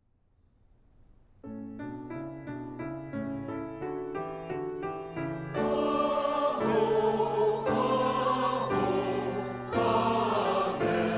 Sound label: amen